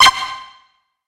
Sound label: beep